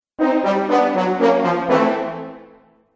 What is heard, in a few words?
Cartoon Failure Brass; Cartoon Music Sound Effects